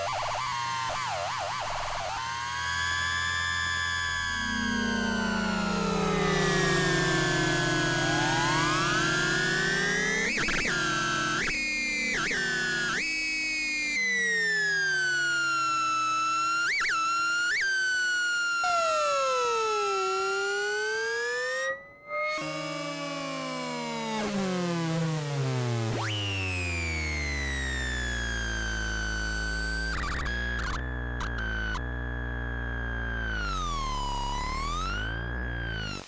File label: dissonant noise